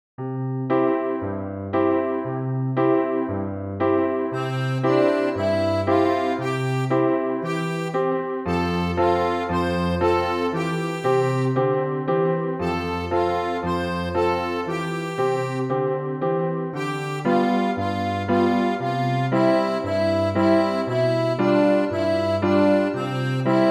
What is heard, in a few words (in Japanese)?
kogitsune kenban; minyou; Classic